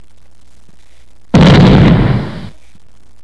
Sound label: boom